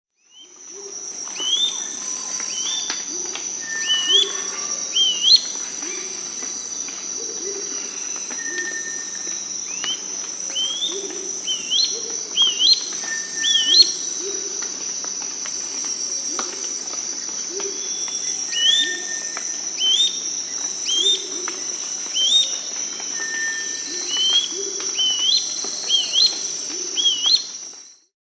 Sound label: Gray-crowned Flycatcher (Tolmomyias poliocephalus), Peru; S. America